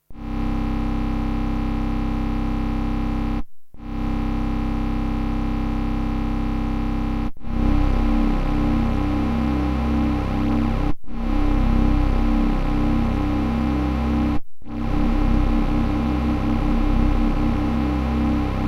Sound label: unison; arduino